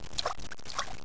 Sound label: swim